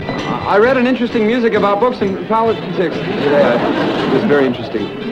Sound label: music